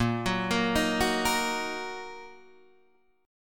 A sharp Suspended 4th Sharp 5th A sharp down Guitar Standard; arpeggiated; JGuitar